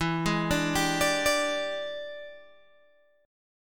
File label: E 7th Sharp 9th Guitar Open G, chord